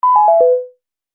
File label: Multimedia Event Notification, beep sound effect